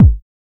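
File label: 909 THUD kick, TR909 KIK; Drums In Your FACE!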